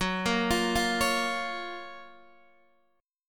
Gb Major 7th, down, Guitar, Open D

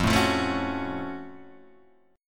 Gb Minor Major 7th F

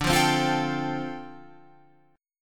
D Minor 11th D down Guitar Open F; Dm11 strummed

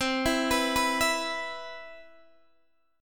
C Major 7th, Guitar Open D

